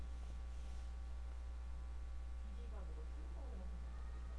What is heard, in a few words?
coin